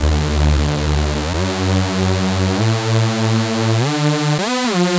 Buzz Synth; Sonic Foundry (Sony Creative Software); Club Joints and Street Anthems; Toxic Rain

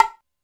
Hi Bongo, Alesis D4 drum machine

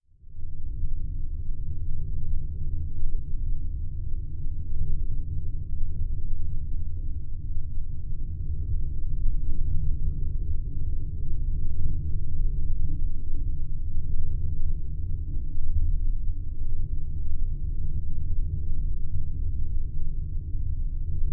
Geofon, Drone, Dark, Spooky; underground sounds

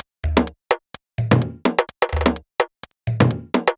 Practice Top C# 127bpm, House Drumloops; 90 s Sample CDs; Zero-G House Fabrik Drumloops; ZG Drumloops

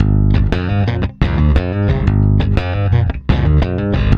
A, Bass; Spectrasonics; Bass Legends; 128 PWRSTRUM

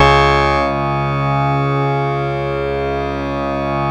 C#, PIANO; Big Fish Audio; Synclav FM

